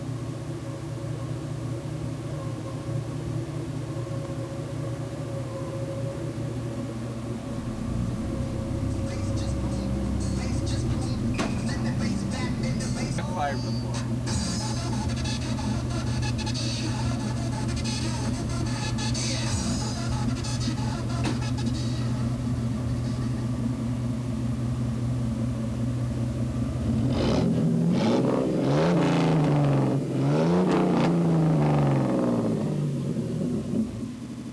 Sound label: bass just boom full; All sounds were taken from a 8mm video camera, and lined-in to the computer; truck